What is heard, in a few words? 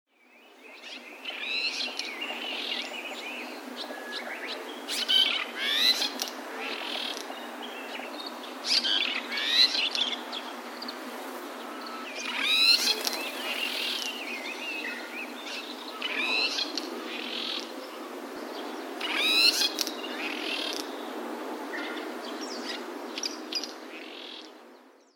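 Red-rumped Swallow; koe; izu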